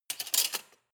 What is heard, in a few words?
cutlery large drawer place soft